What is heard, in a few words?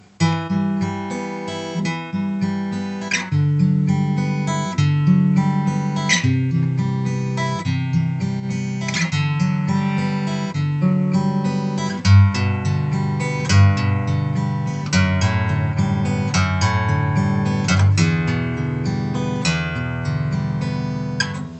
fingerstyle dread